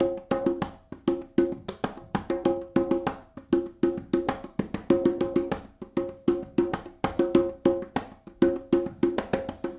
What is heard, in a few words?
Smooth Groove Congas, 70s Analog Funk; Sonic Foundry (Sony Creative Software); What it Is!